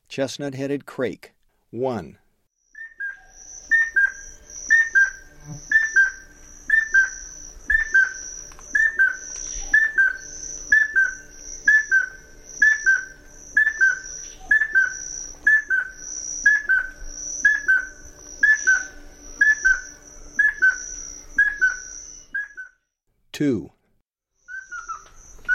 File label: Chestnut headed Crake; S. America